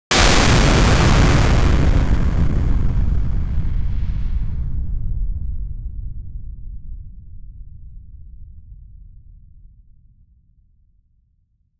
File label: the sound of a plasma, explosions